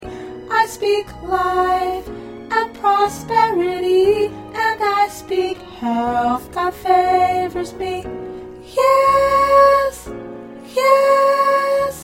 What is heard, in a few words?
Soprano